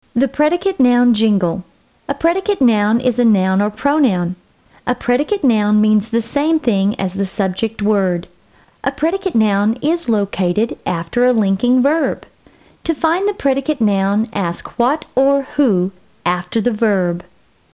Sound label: predicate noun jingle